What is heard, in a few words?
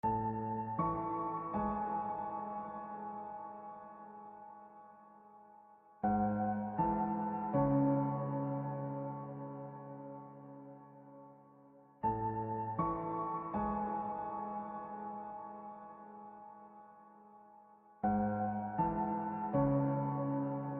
piano